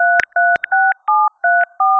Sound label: tones mono; nero; 16000; aac he